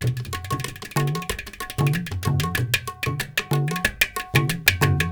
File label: INDIA L; Spectrasonics - Heart of Asia CD2